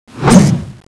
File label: attack swing, melee miss; The Monster Plane; kolmods